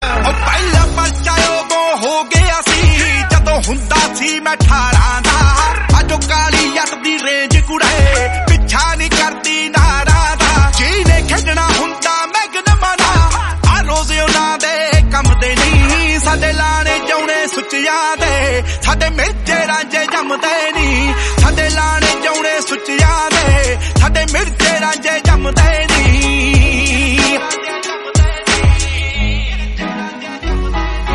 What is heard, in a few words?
punjabi song